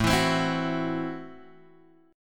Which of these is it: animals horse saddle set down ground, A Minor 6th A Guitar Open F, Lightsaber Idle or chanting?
A Minor 6th A Guitar Open F